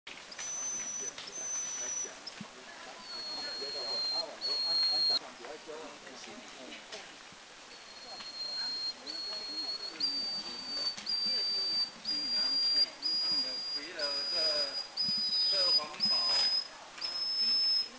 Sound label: Yellowish-bellied Bush Warbler